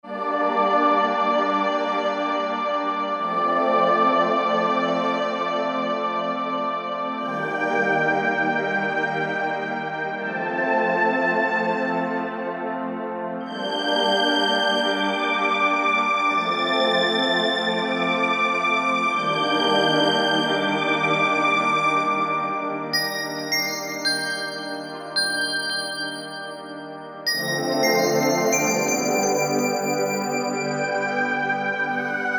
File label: Score2, Korg MSC-2S Synth combinations; Synth 1